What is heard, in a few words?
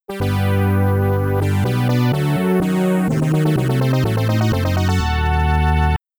1st Lap Clear; Ghosts n Goblins; Amiga